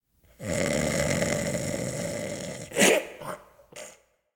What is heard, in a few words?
Prisoner Snore